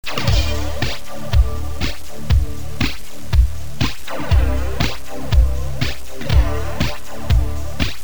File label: depressing rock music